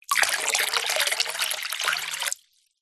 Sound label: household water pour into metal bowl from cup